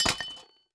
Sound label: mine metal